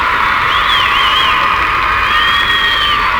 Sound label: WHISTLE LOOP; UFCTapout1; XBOX